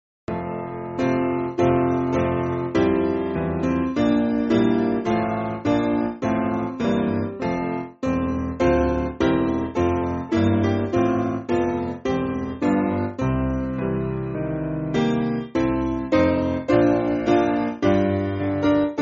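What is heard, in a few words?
Piano, Music Backing Files for Churches; All Praise To Thee; Engelberg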